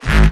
Offbeat Bass G#; Vengeance Samples

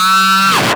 Acid Sounds F#, Synths; Vengeance Samples

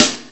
Snares & Claps; Vengeance Samples

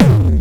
Bassdrums Hard Kicks; Vengeance Samples

